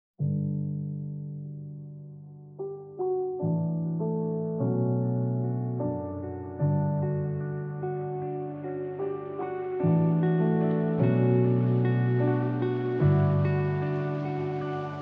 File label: Forest Song, Nature Lo-Fi